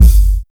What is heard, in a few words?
rich mid range kickdrum g key, Royality free kick sample tuned to the G note.